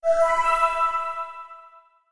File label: lb recieve; MBHaxe; marbleland